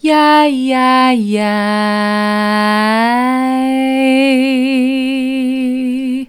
yi yi yi soft, vocal

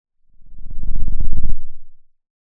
Sound of a lion’s roar

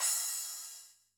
Crash, drum

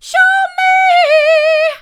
hard, vocal; show me

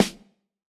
Snr, drum

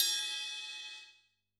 Ride; drum

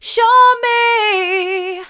mid, vocal; show me

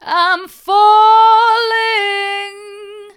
i'm falling hard, vocal

